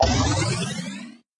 multiplier up

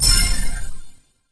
combo

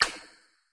button press

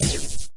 electro path

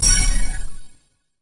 combo

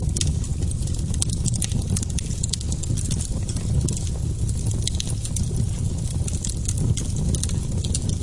flame loop

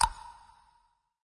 button release

